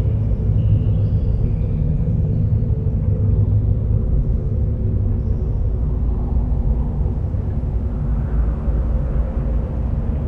CAVE AMBIENT

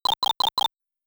Stage Flag -Appearance; Galaga

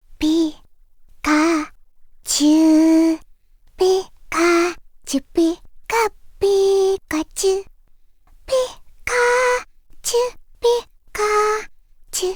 Relaxing Sounds, Lullaby

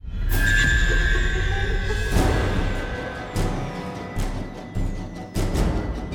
Jump Scare Intro; We Happy Few

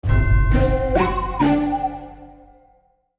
Spooky Stinger

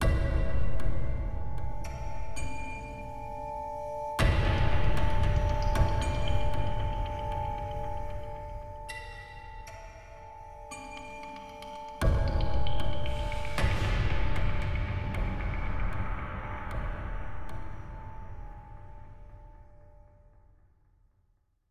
Wasteland Front

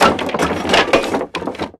Jumble Tools; Prison Architect

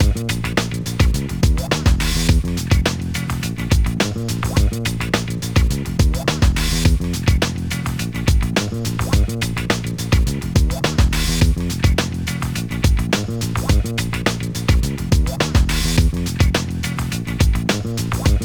Load Loop; NBA Street; GC; PS2

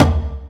Drum

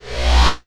Weapon Fully Down; Blastmorph Pinball; xbox series xs

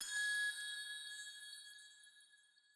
Alchemy Flute Combo

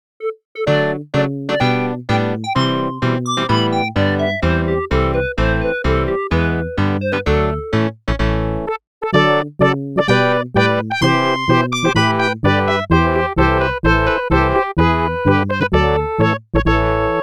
Building A Building; Monopoly; Genesis gamerip 1992

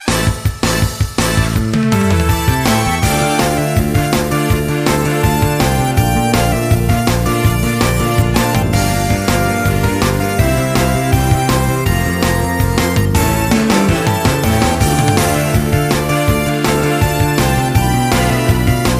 Music Menu event

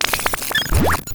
special mission stealth loop; Holy Potatoes A Spy Story

